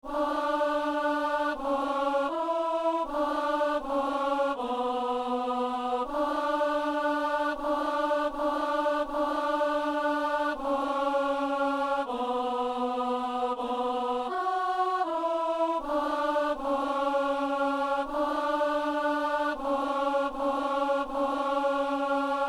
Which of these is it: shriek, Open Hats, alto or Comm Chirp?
alto